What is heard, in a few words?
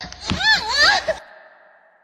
moan